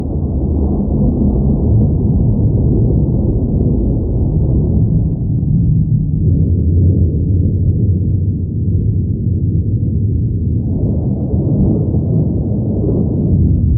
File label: Intro Cave (Background Noise); Aer Memories of Old